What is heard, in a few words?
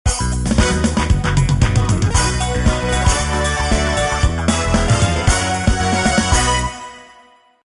level complete; BombIt5